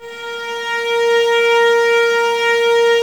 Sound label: STR VAS A#, String Ensembles, Violas, No Vib; Miroslav Vitous